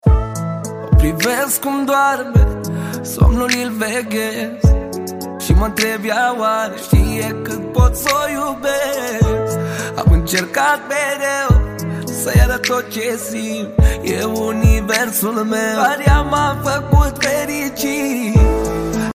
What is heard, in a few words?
Sax